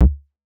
00s solid kickdrum d key

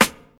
crunchy snare drum sample c key

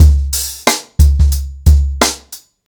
90 bpm drum loop sample c sharp key

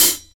sharp high hat sound f key; hat samples

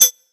raw closed hi hat sound g key

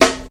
hot snare c key

